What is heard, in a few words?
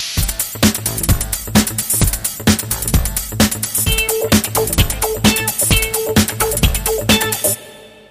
Funkadeclic